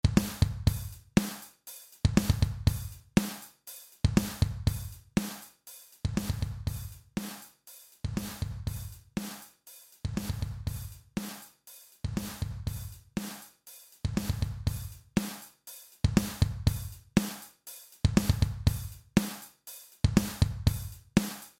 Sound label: less attack